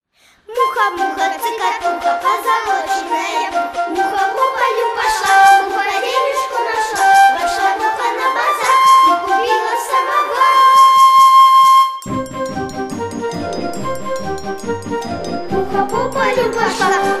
khor plyus, detskii myuzikl

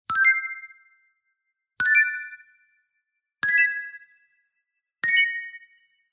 4 zvuka kolokolchikov na povysheniye; audio effekty dlya prazdnika

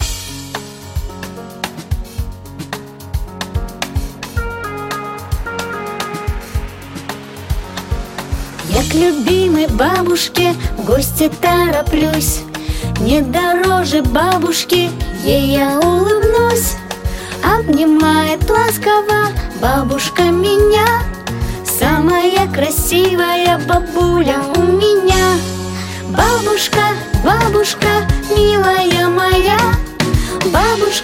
babushka pesnya

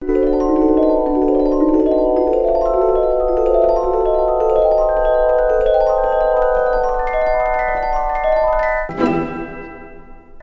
zvuk volshebstva; audio effekty dlya prazdnika

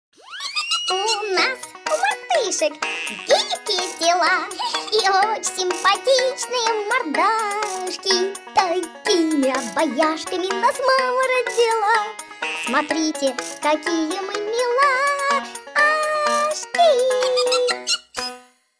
fonogramma

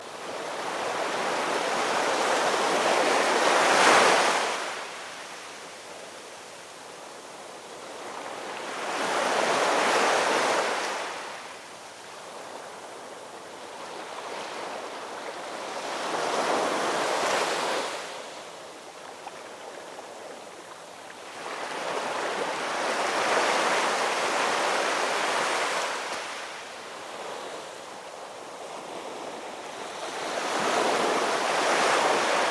zvuki shum morya; den neptuna; zvuki dlya det skogo prazdnika

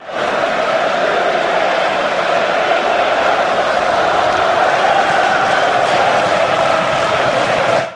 shum fanatov; det-skaya-olimpiada

